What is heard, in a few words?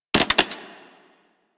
Vomit Gun Mech, Weapons, Infested; Warframe